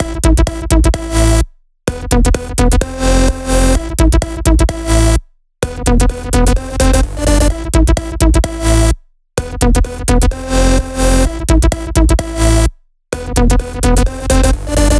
128BPM Melody; Vengeance Samples; Streetfight Kit

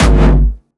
Jumpstyle Kick G#1; vengeance essential club sounds-3; VEC3 Bassdrums

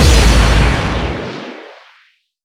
Impacts

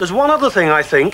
Vocal Phrases; Vengeance Samples; Vocals

